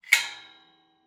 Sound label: Iron gate, latch, sliding, rotating, lock, clang